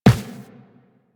Run Default, Footsteps; Warframe; Tenno

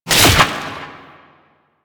Orb Destroy; Warframe; Duviri; Conservation

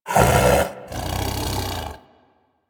Snow Critter Vocal Snore; Warframe; Hunting Critters

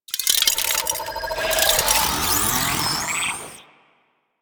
God Ray Start; Warframe; DE; Sentients; Sentient Trooper

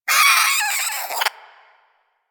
Vocal Death; Warframe; Hunting Critters; Cryptilex

